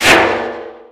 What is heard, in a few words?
metal sheet impact soft; physics; Garry's Mod; hl2